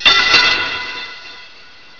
prison metal

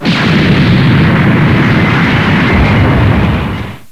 Explosion

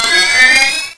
fusion charging, weapons

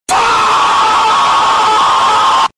fuuu

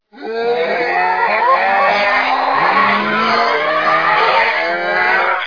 MOANS

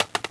mag drop, weapons; PD2 common

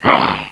pain

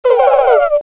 coo; physis

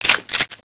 breaker 12g swing; PD2

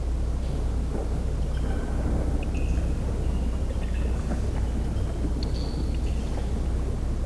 water drip rev

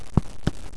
step

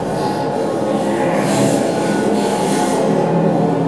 loud ambience

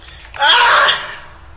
aah!

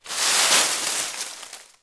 leaves

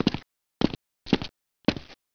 intro steps

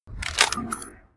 reload push, weapons

